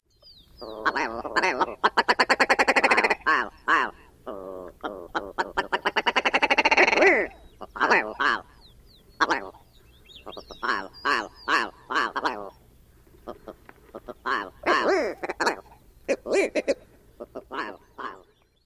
display sounds

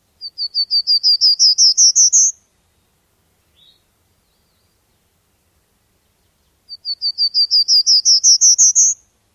songs

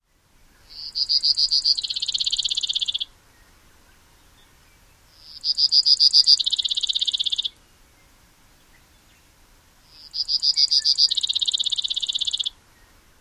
songs

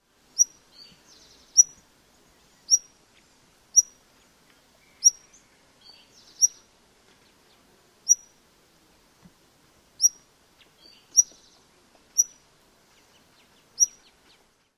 calls

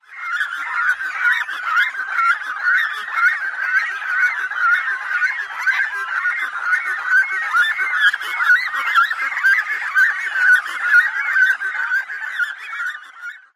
grating calls of nestlings

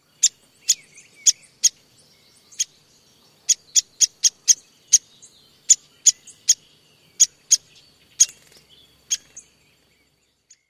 tweek calls; KY

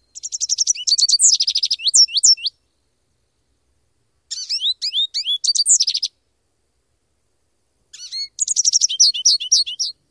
brief songs summer territory

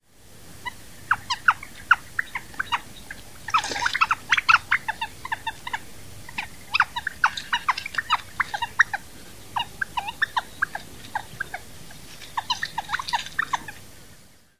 interaction calls; AZ

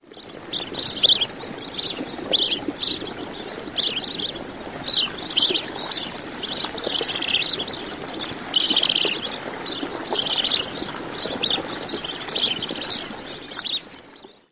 calls

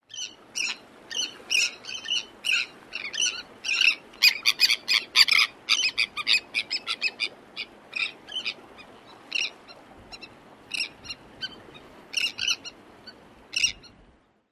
more calls

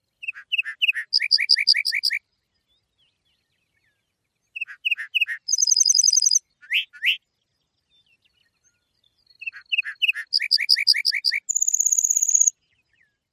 short songs

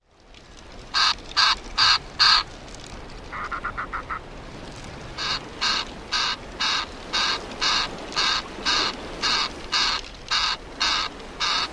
guttural calls, WISTPE

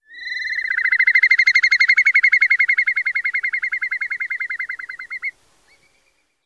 song fragment

